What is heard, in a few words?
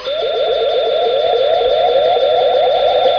ESP sound effect; UFO